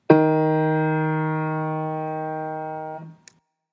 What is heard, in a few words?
tenor Eb